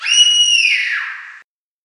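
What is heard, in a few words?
civilian female scream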